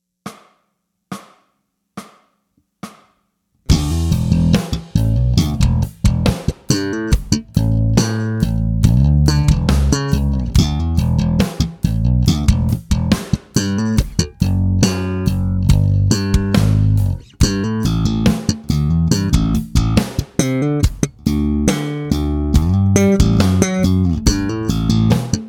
Slap Speed Exercise

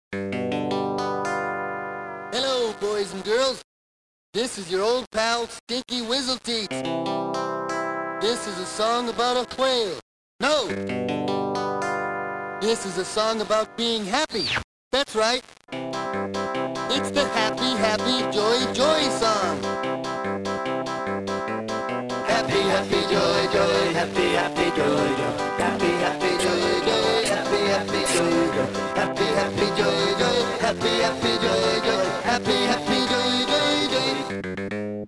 Sega Genesis; The Ren & Stimpy Show; Stinky Wizzleteats; SupremeMIDI

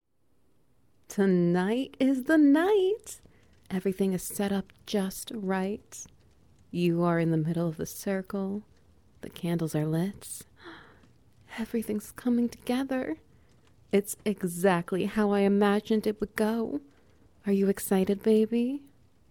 Ambience Wet Noises

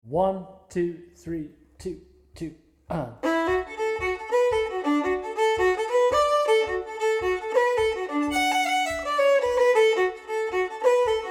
The Whinny Hills Of Leitrim - Fiddle Full Speed

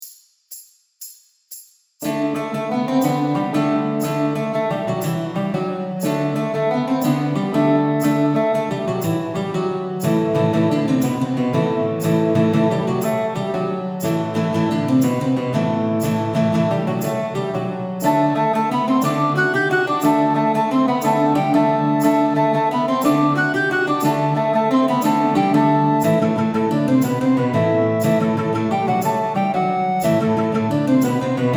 Backing Track; MediEVAL Etude

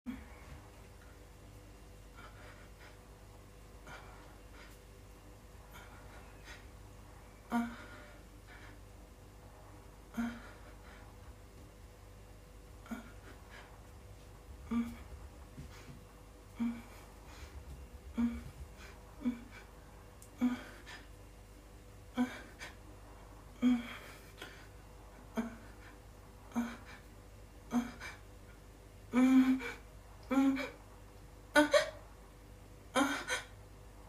moanz

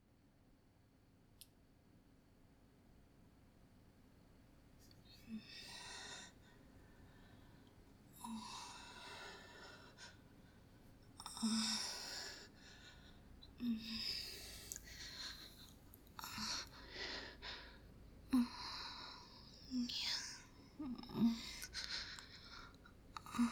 washing pleasure moans

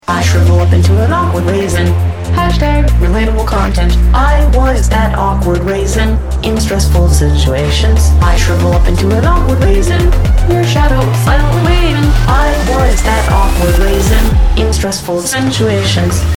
ringtone android

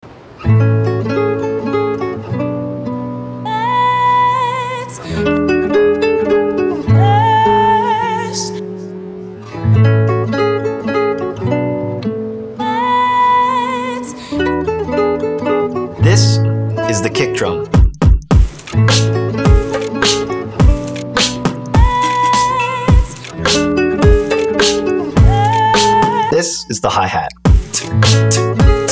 Beat

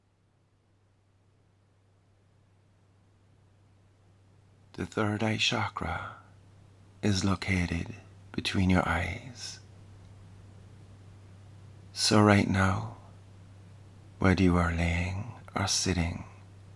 Pink Noise & Miracle Tone 963hz; Gaelforce Audios; Third Eye Chakra

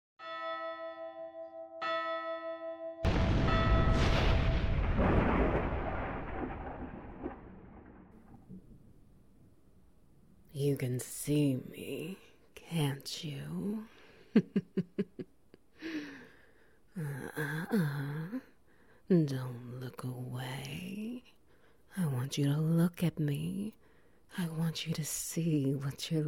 SFX

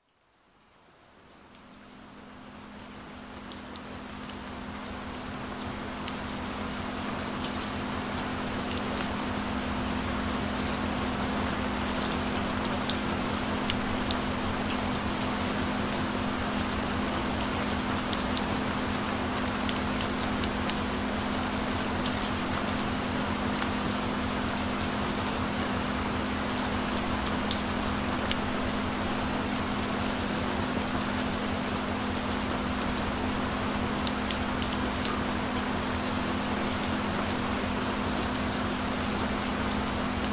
Rain Sounds; Weight Loss; Metabolism Booster

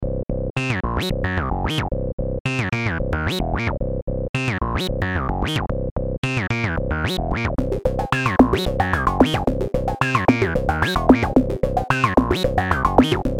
synth

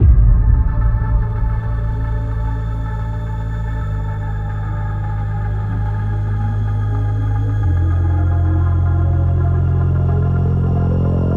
Bass

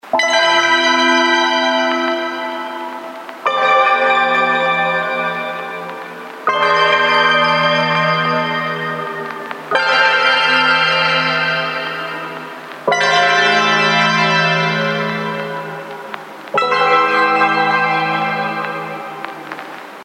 Interface Ringtones

